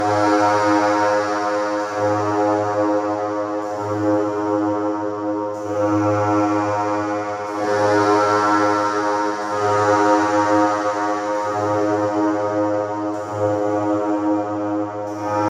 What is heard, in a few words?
space reverbed house texture 124 - G#; synth loops